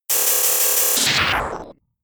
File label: Charge Beam; Charge Beam part 1